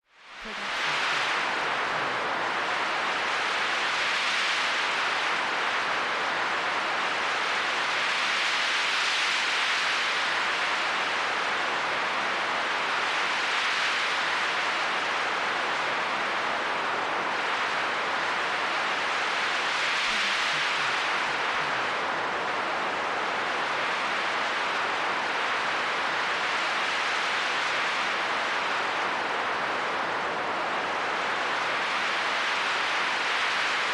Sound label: wind with reverb; soundscrate